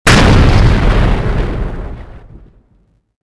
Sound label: explosion huge; weapons explosions